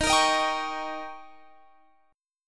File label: D sharp 7th D sharp, guitar